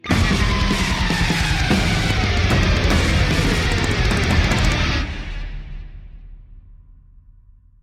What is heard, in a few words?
Mirror Universe Boss (Intro Sting); Star Trek Online; Xbox Series X/S